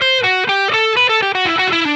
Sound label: Electric Guitar, Loops; Guitars